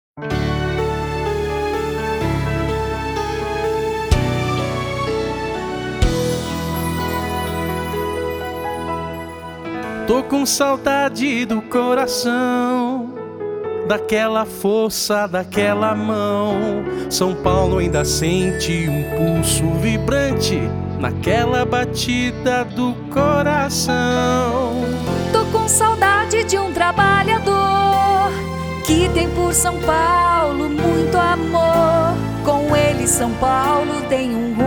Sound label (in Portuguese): Jingle